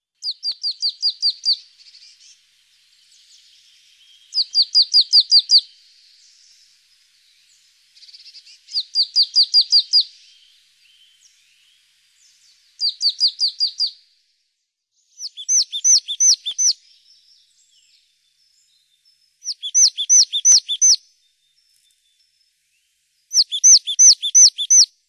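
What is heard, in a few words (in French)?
Mesange nonnette, oiseaux; birds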